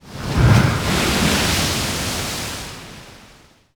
splash

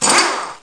Program Error

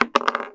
wood; Shadow Man; weapons; deagle

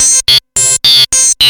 amorph, Techno Fx; Magix Music Maker V2000 Soundpool A

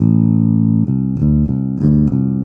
Rock, Bass; ACID MUSIC

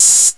Analog HH Open; TG300B; Drums

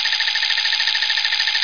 loop slot; heretic ii demo

